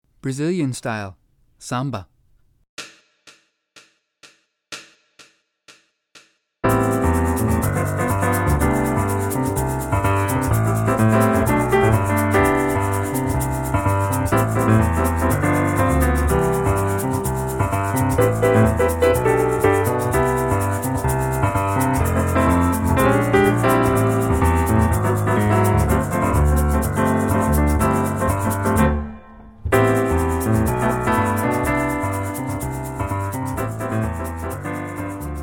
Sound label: samba